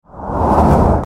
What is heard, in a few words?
Car passing by